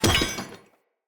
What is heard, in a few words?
Two Handed Mace Drop A; Weapons; Enemy; Blunt; Sinecure Two Handed Mace